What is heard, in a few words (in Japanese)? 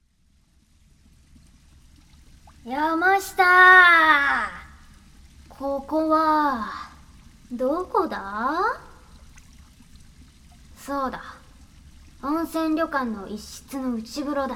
シャンプーASMR, BGMなし; 裸のお付き合い